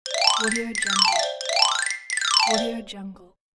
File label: cartoon xylophone rolling effect